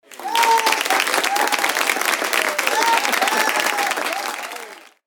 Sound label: crowd Applaud